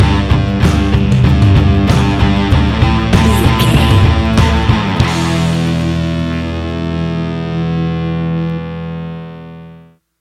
riffs and dirty chords stinger, A rocking music track with rock guitars, heavy rock drums and thumping rock bass lines; Heavy, loud, aggre